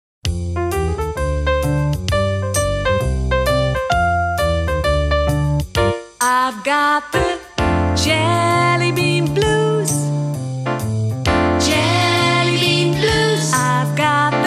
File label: Jellybean Blues